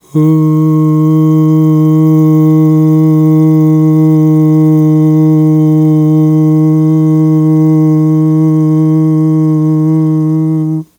AHHH, Vocal; Polyend

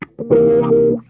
NOTE, Funk Guitar; Zero G - Funk Guitar

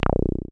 SYN 303, Roland TB-303

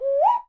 bird call

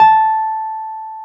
PNO, Wurly

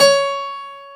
PF C#3, GRAND PIANO; AKAI S6000 CD-ROM - Volume 1

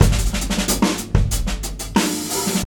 DRUM 91

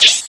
RSS, 160 BPM

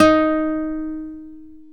Guitar Classical, Nylon String; Roland LCDP02 Guitar and Bass

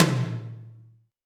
Tom Md Cannon, Alesis D4 drum machine

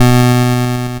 NOTE bass B3, LSDJ; LIMAT LSDJ kit; LSDJ Notes; NOTE KIT 2; Drums

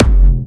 Jump Kicks, Bassdrums; Vengeance - Essential Clubsounds Vol.5

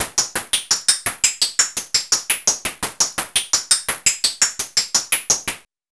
loop 85; AMG - Vince Clarke Lucky Bastard

